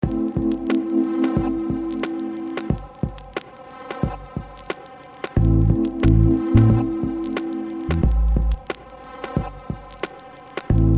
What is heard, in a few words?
funky train